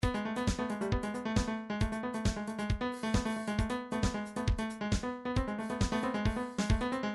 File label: trance midi loops beats sfx run; Newtronic Trance Constuction Kit